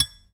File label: Plating Mine